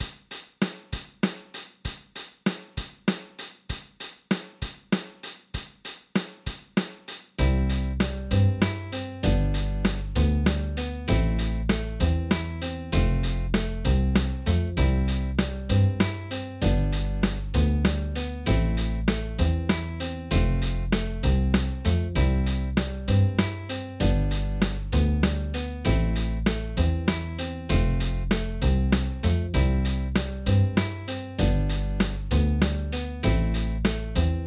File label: 2 vs. 3 backing